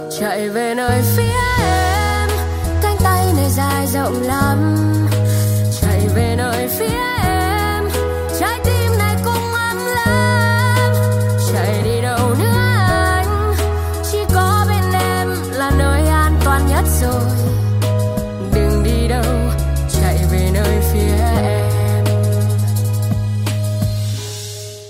Nhac Chuong Giong Nu Cover

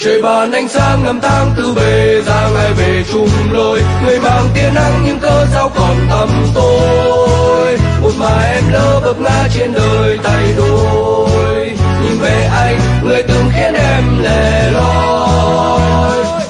Nhac Chuong Nhac Hanh Khuc

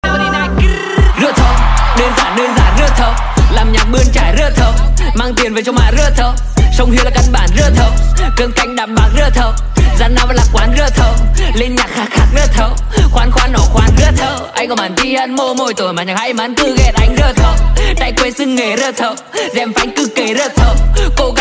nhac chuong rap rap Viet; mua 4

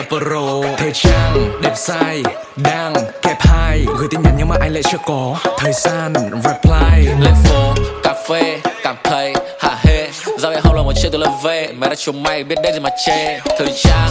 nhạc chuông rap

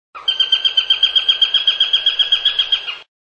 Tieng Chim Ung

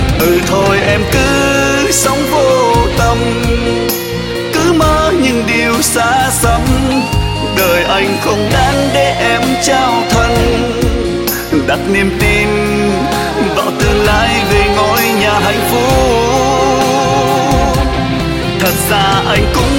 Nhac Chuong

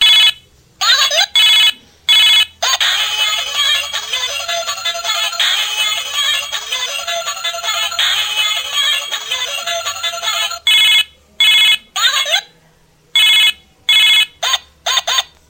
Nhac Chuong Dien Thoai Do Choi

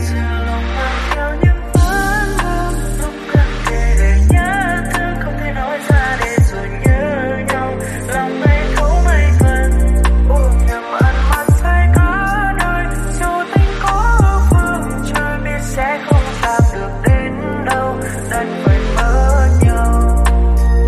Nhac Chuong Lofi

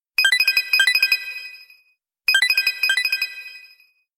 Nhac Chuong Tin Nhan iPhone Electronic

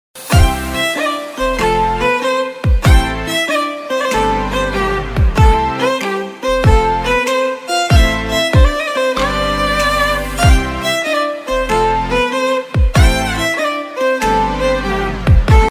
ringtones không lời

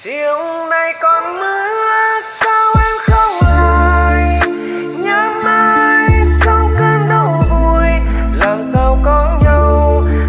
Nhac Chuong Lofi

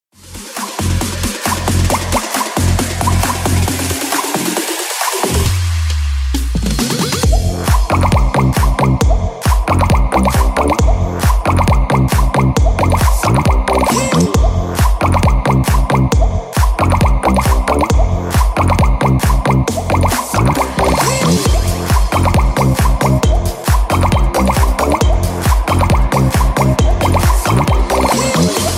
Tieng Giot Nuoc Remix

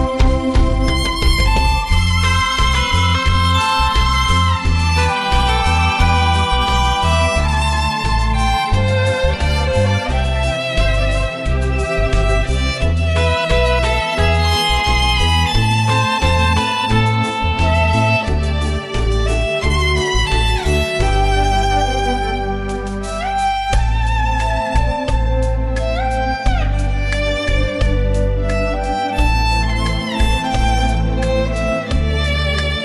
khong loi violin

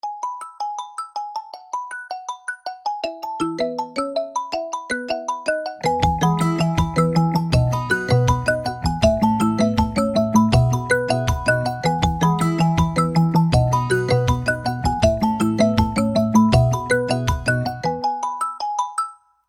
khong loi xiaomi miui

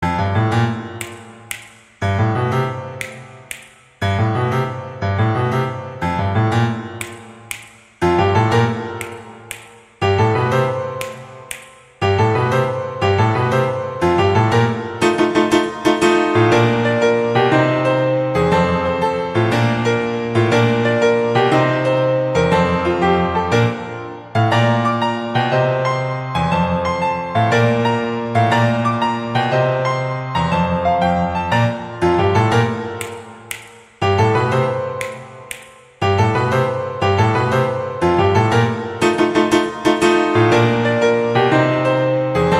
piano nhac phim; gia dinh addams

